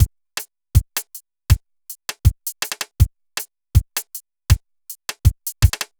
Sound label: Synth Loop, BPM 80